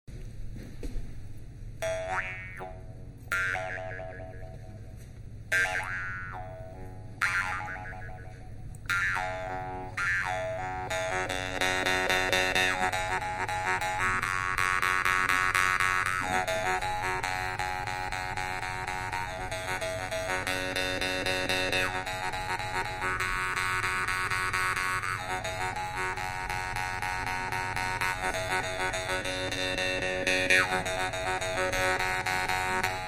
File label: Xomus